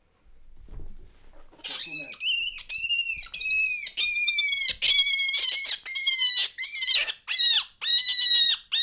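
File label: between the two microphones, domestic rabbit; jászberényi zoo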